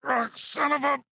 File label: AHurt